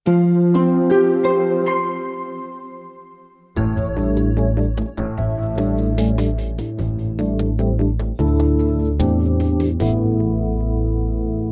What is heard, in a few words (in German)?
Klingelton